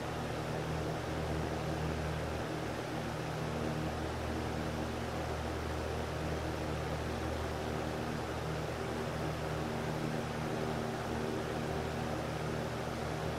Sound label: fan